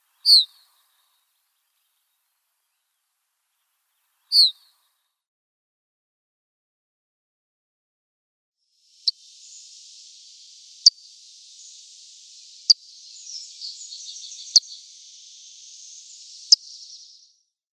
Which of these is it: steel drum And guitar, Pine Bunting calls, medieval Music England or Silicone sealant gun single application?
Pine Bunting calls